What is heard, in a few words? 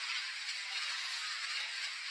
loop hi; gmod; lfs luna s flight school